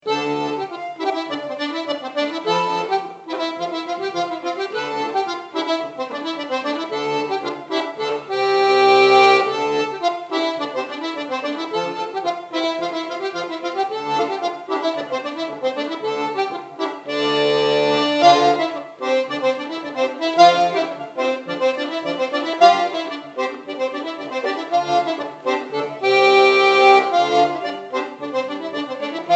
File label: Bourree; Pichon